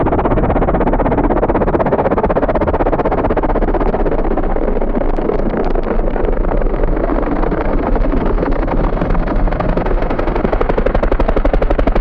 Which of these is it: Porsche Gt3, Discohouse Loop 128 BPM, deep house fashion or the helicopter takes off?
the helicopter takes off